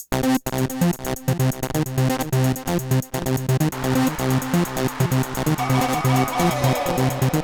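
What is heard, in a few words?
Core, Hardcore Breakbeat, 129 C minor; Big Fish Audio; Hardcore Breakbeat Action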